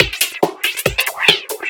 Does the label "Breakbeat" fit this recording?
Yes